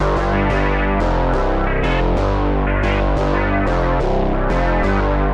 Bass loops; Zystrix

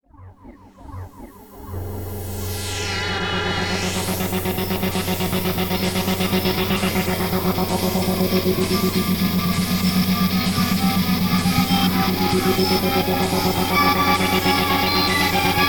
synth pads; stereo; slowly evolve over time; Zystrix; Intros, Interludes, and Endings

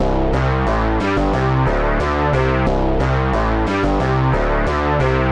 Bass loops; Zystrix

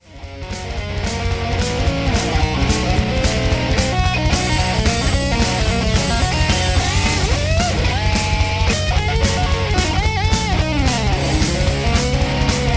open string runs; rapid open string runs using finger-picks; Zystrix; metal guitarists

